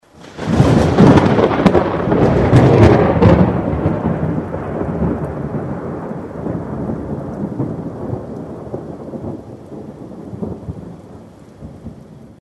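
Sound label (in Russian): удар молнии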